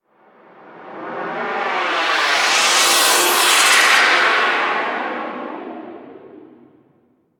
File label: Gas Air Gust Short; Ambience; Warframe; Corpus Gas City